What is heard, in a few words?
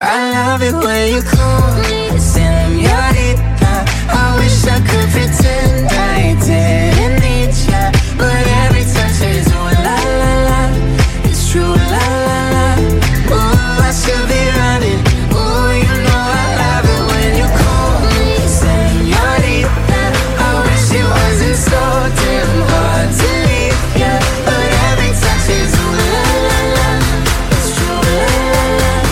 Rington Khareji, Sad Ringtone